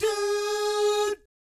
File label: DOO SFZ, Jazz